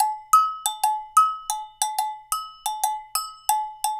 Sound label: Percussion, 120; Sampleheads; NYC Percussionworks; 90 s Sample CDs